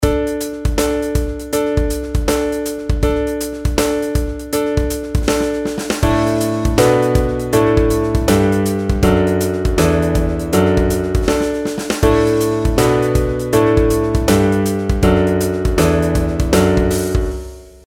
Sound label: pitch patterns stepwise; melody